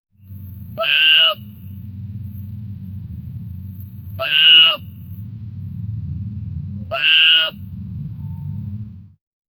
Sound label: high quality isolated zeb; animal vocalization